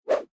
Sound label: Whoosh Swish Sweep Long Bamboo Cane Low Pitch High Speed; Staff And Rod Sound Effects; Staffs and Rods